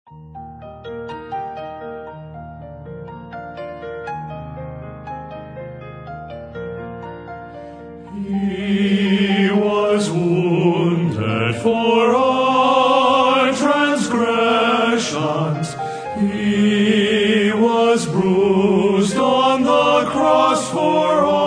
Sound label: Men's Quartet